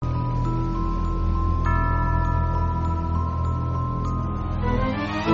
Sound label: ghost music